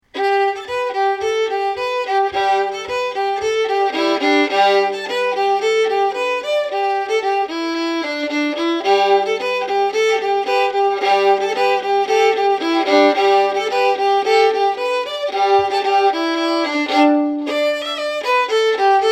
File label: polka